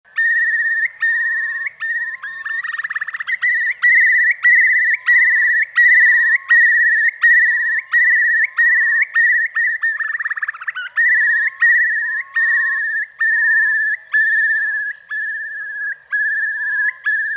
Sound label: Curlew Sounds